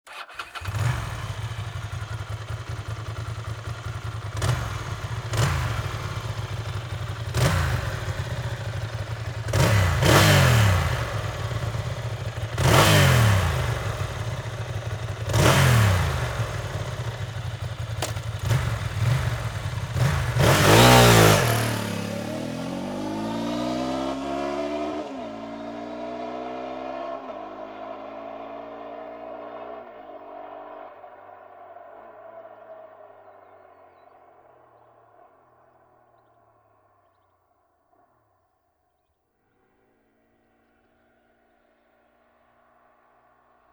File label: Ducati ST2; moto